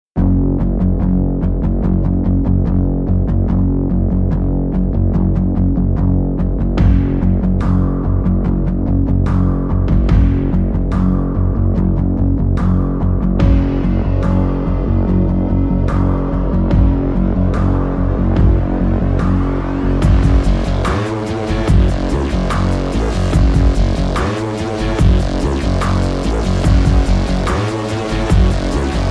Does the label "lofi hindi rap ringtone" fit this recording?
No